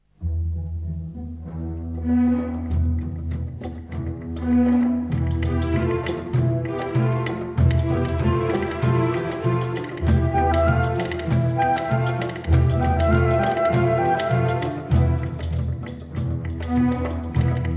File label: Arabic Suite Celebrations